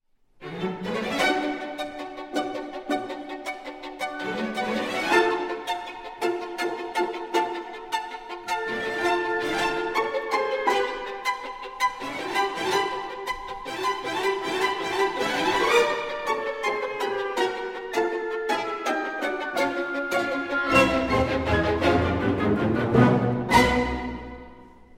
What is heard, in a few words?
Violin Concerto